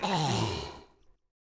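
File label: goblin voice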